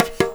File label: NAGARA; InVision Interactive; Percussion Slam; KWICKIE